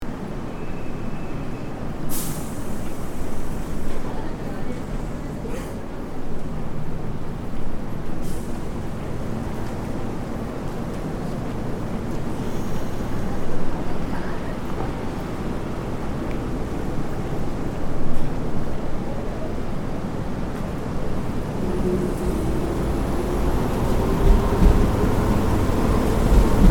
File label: rail station